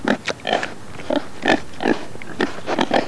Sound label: pig grunt